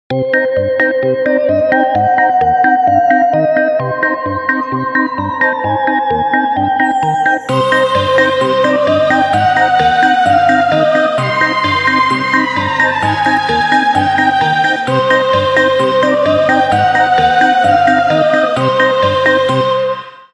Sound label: Haunted, Halloween ringtones; Sony Ericsson; Halloween 2007